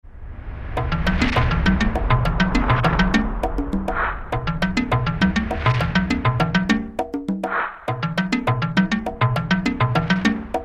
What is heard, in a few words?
JUNGLE, Roland R-8